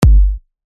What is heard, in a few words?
Kick Style Kick Drum Alternate